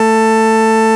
FARFISA, ORGAN; Vintage; Sonic Reality; Vintage Timetraveler

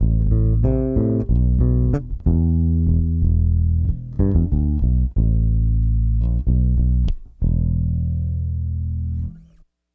bass, analog funk; Mixed Berry Jam; Sonic Foundry (Sony Creative Software) - What it Is! 70s Analog Funk

